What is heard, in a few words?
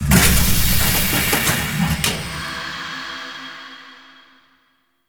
glyph puzzle locker open; Warframe; Duviri